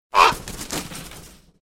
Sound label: bird crow